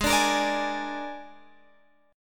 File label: G sharp Suspended 2nd Flat 5th, Guitar Open G; 18,19,20,19,21,x